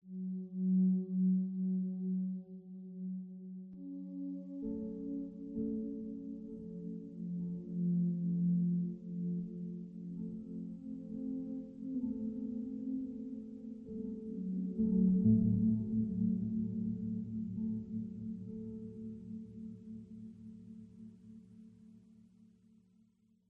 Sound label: ambient